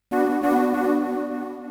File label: wings, 140bpm keyboard; hous-tec